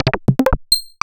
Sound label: Oberheim OB-Xa Drums